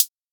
Hat, Afrohouse; Ghosthack; Drum Mega Bundle Demo; Afro Drums

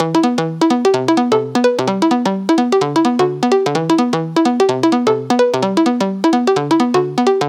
House Arp 128 BPM E Min; Synths; Loops

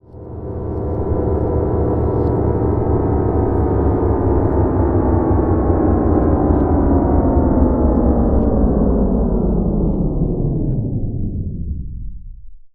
piano FX reversed pitch down; Cartoon - film effects

